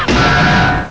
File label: metal